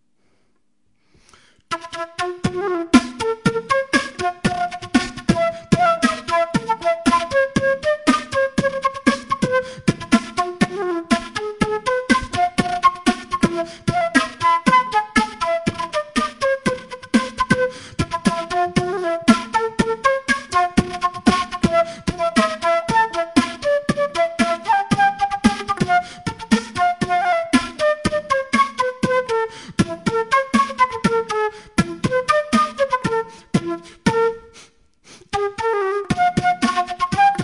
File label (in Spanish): FLUTEBOX, Flauta Beatbox; TANGO